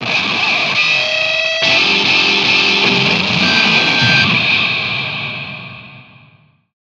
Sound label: Windmil; Itar Windmil; Deadcraft; PS4; Xbox Series X/S